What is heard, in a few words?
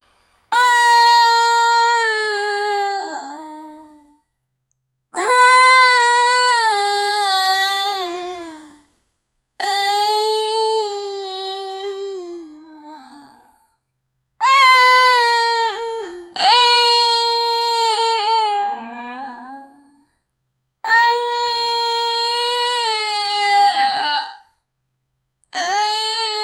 moaning anime girl; animated